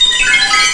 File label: Clackety